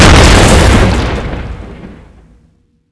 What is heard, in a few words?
hammer skill exp; knifeze